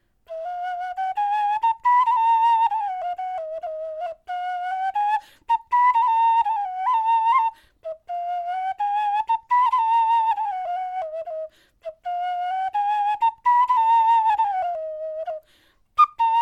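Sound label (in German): melodie, Knochenflöten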